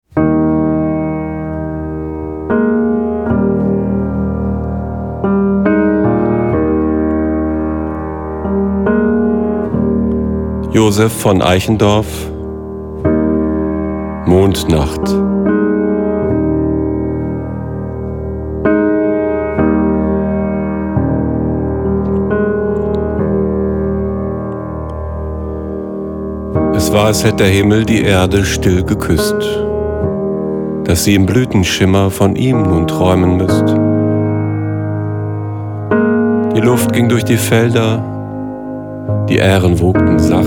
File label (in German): Mondnacht mit Piano